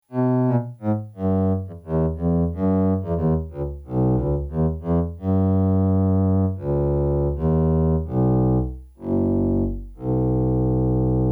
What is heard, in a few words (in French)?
FM DX7 Contrebas AC; à jouer en coups d'archet. Le grain du son naturel a été particulièrement recherché